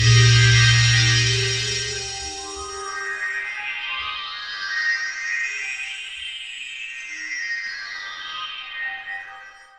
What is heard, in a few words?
space sounds, synths